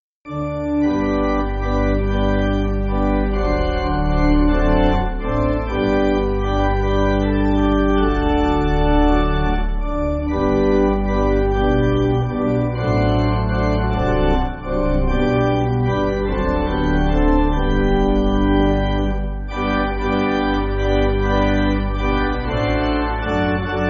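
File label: Pipe Organ, Backing Files for Churches; So Precious A Treasure; Jugendpsalter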